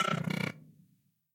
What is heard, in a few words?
Gamble sfx lose; Rabcat; Classic 243